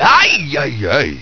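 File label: scream; duke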